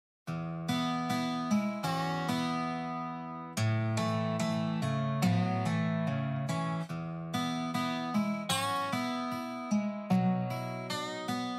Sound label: RODEO STEEL GUITAR